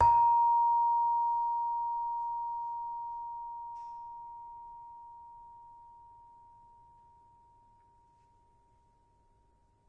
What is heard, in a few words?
Saron, Indonesian Saron (Multisampled); WORLD